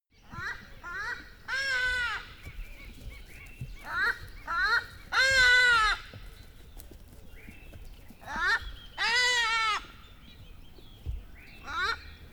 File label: bird call pass by